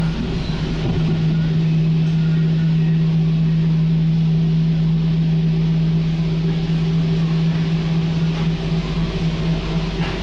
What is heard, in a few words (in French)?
virage